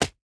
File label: modal lose